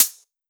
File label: drum hitwhistle; osu!